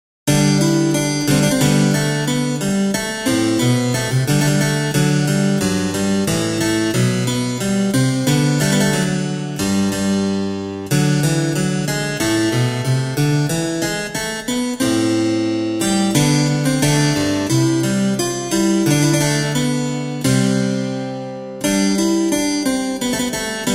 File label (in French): clavecinissimo